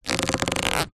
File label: foley rubber stretch stress; zapsplat